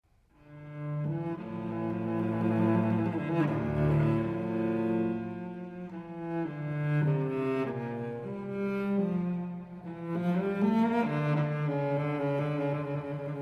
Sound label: Unaccompanied Cello Suite No. 2 in D Minor Sarabande; BWV 1008